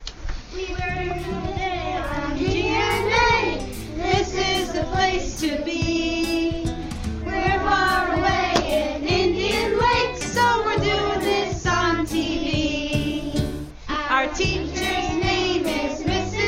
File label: song; indian lake neric